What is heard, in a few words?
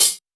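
Open Hat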